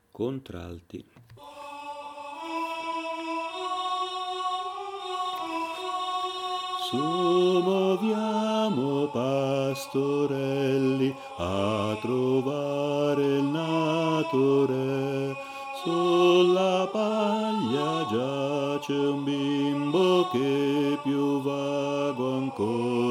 Su moviamo Pastorelli Contralti, Coro; Natale

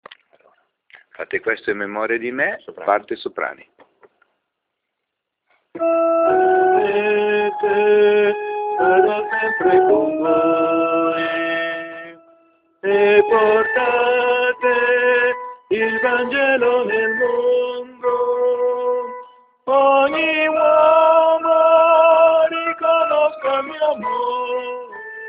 Soprani Voce, Coro; Visita Arcivescovo

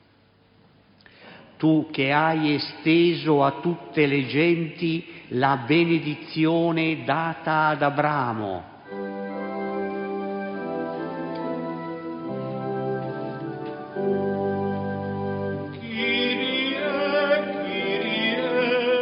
Kyrie Eleison, Coro; Arcivescovo del Pini

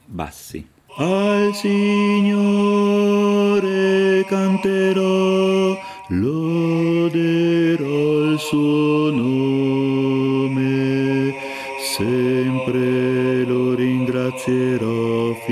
Bassi

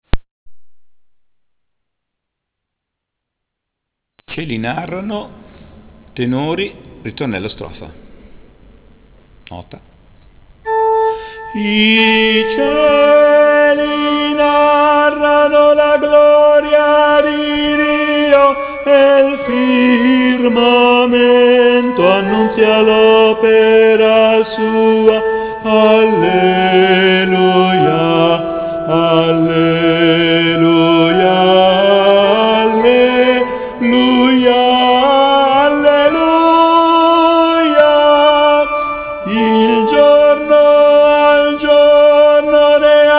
Tenori; 156 I cieli narrano

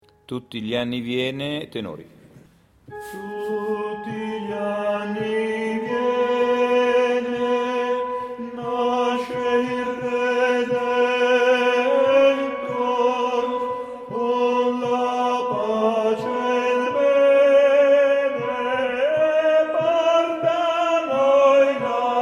Ten Cant

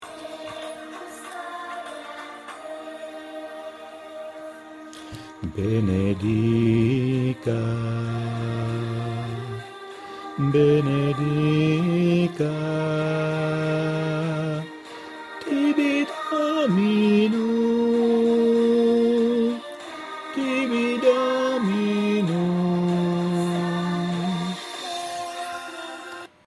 Ritornello Sop Voce Orig; Benedicat tibi Dominus

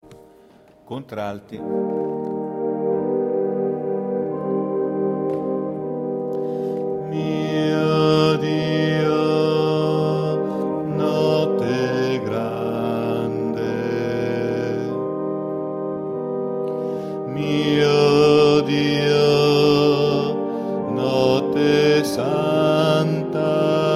Contralti; Natale; Mio Dio notte grande

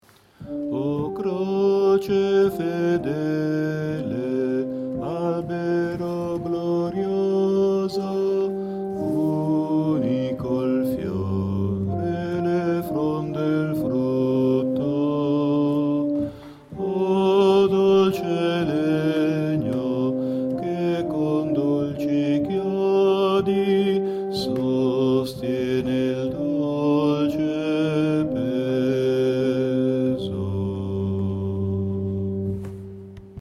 Bassi; 206 O Croce fedele

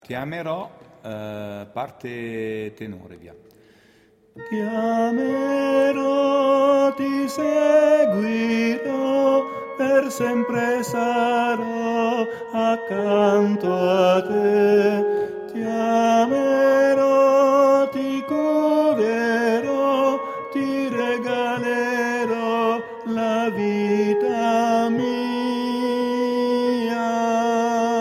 Ten Cant

